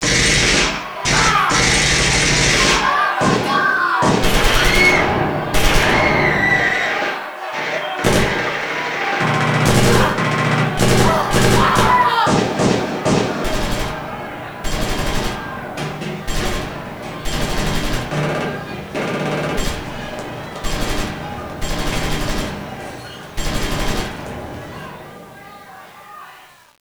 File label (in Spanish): sonido hospital; ze hospital night